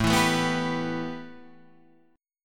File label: A 7th Suspended 2nd, A, down